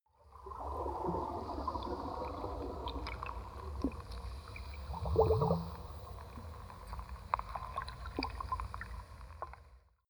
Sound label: sea